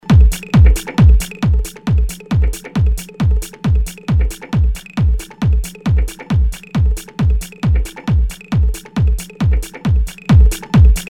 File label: AUTO PAN